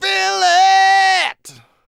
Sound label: MALE HUMAN, Vocal, R&B Dance